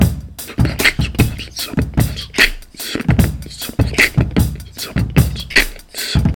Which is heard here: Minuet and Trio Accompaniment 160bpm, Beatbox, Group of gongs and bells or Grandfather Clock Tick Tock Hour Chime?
Beatbox